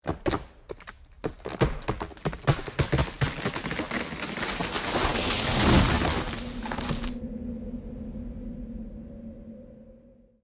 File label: ice collapse